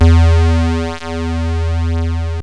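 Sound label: dual square, yamaha rm1x synth bass and lead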